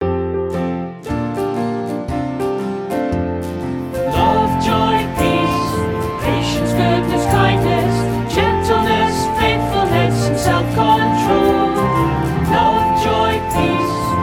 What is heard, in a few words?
Full Vocal